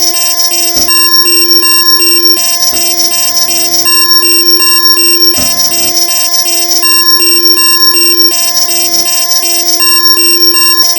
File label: NOISE, Synth FX Misc; E-MU Producer Series Vol. 8 – Platinum Phatt